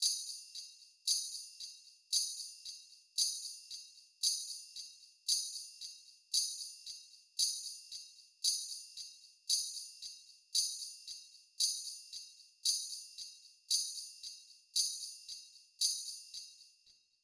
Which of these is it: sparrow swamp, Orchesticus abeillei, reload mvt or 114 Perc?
114 Perc